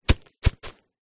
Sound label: sport soccer ball single bounce to stop grass